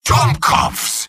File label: medic negative vocalization; mvm